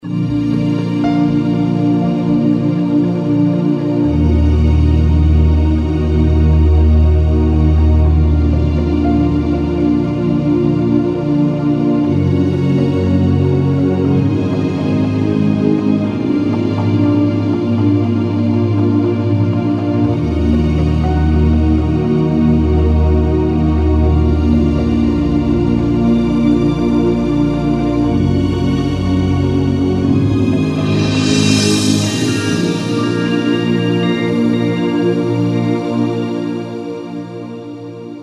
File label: Good Feelings Ambient